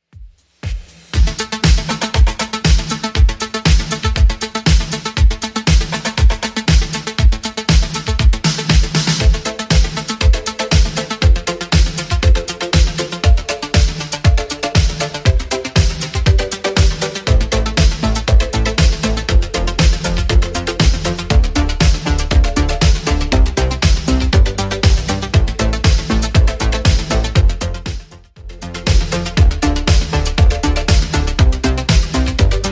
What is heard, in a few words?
80s Redrum 119bpm